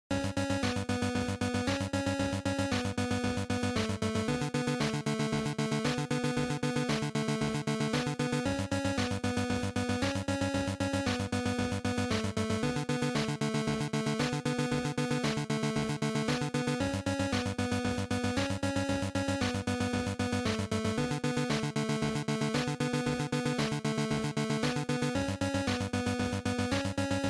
GB Action Boss

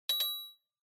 Onoma Inspiration

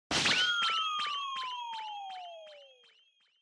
Motion Blow Away Long

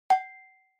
Cowbell High

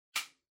Lighter (Far-Close)

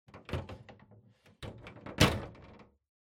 Air Conditioner Panel Open

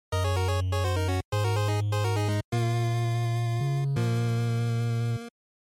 NES Action Game Over

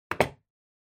Cutter Put Down

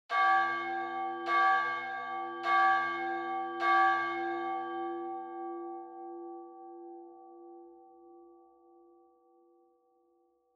Church Bell Far Long

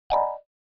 OS Sound Notification

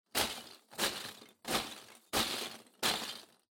Loose Fill Packaging, Rustle; 梱包材

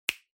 Finger Snap Foley, Dry

